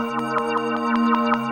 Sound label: super bounce active, marble; KevinBlast